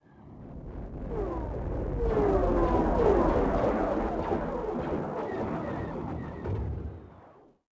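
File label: squad distant battle; Star Wars Obi-Wan; XBOX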